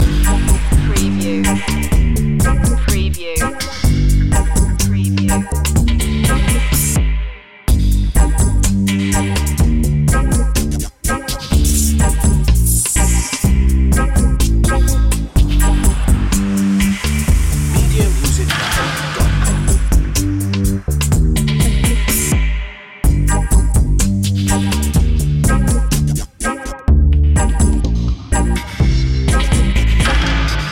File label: dub weed loop